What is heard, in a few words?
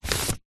foley paper sheet a4 run through hand; zapsplat